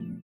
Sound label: VIBRA; Spectrasonics; Metamorphosis